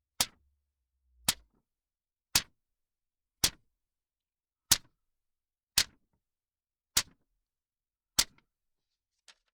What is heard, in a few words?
Paper strikes